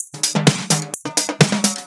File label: Percussive Loops 128BPM; Vengeance Samples